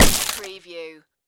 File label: Door Kick Break Wood